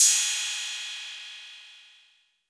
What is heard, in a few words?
Roland 808 CY